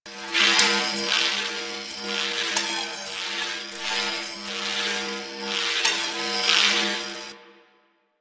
Glowing Egg Tiny Loop; Props; Warframe; Entrati

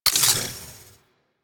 Modular Secondary Clip Mech, Weapons; Warframe; SU Modular Guns

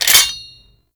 Cannon Mech; Grineer

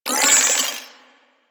Flare Impact; Warframe; DE; Sentients; Sentient Trooper

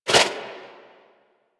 Turbulence Deflect; Warframe; Tengu

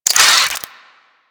Infested Ashen Spike Cast; Warframe

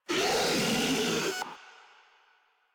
Infested Ancient Player Spotted

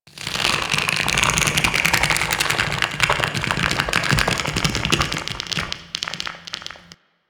Infested Tendon Stretch; Warframe; Corpus Infested

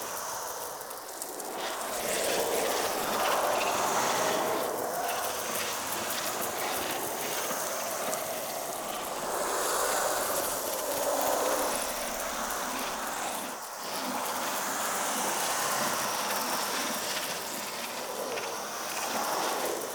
Fey Eldveil Active Loop

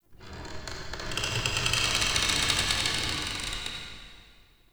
Ancestor Tree Wood Creak; Soul Plane

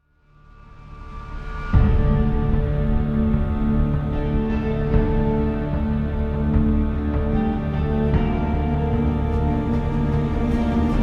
Quest Outro Cue, Music; Warframe; DE; Entrati; TennoCon2023

